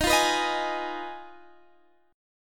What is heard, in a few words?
F Minor 7th Flat 5th Eb, guitar chord